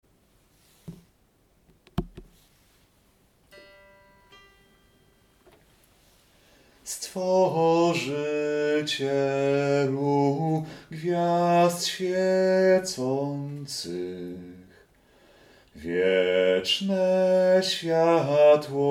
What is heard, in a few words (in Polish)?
Stworzycielu gwiazd swiecacych Bas; Adwent